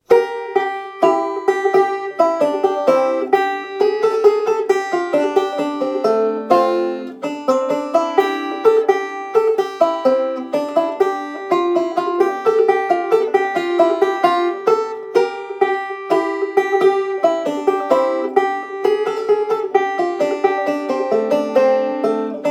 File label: Beaumont rag, banjo; Anytuned Loop